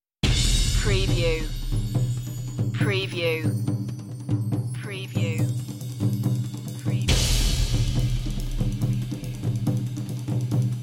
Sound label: tribal march percussion